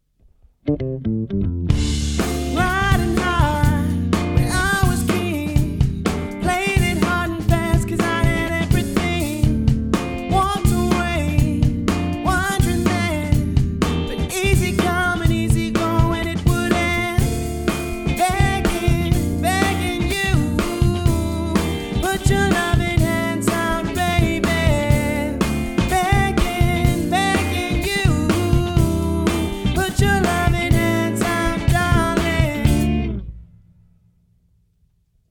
Motown Live

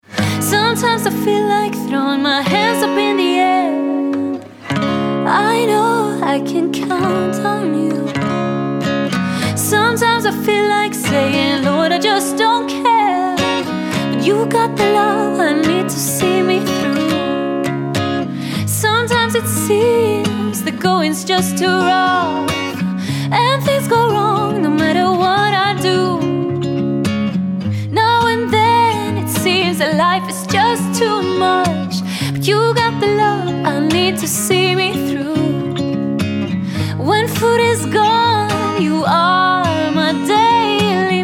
Acoustic Duo; London

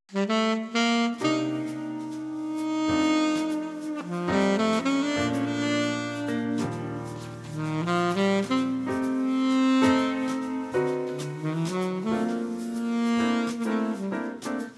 Saxophonist; London

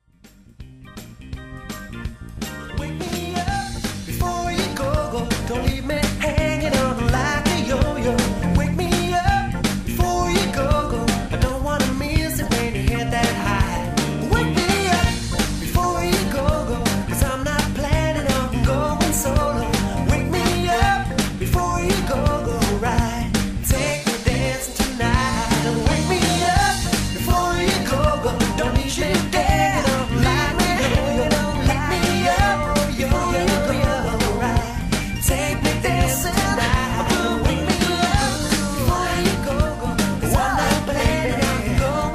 80s Rock Pop Band; Hertfordshire

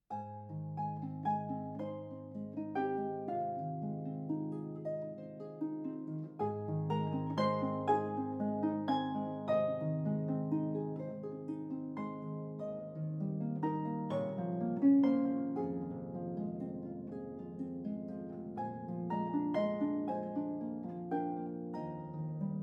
Harpist; London

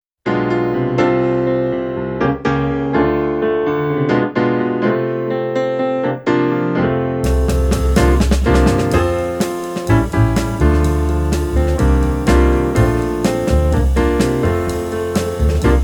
Jazz Trio; London

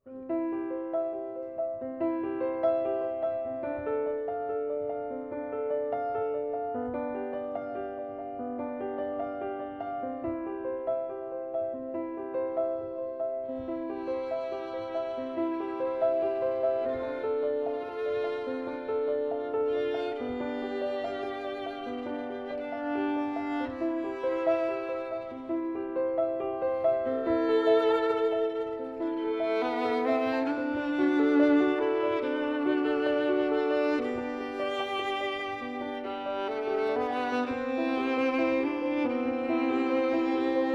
Bach Solo Pianist; Buckinghamshire

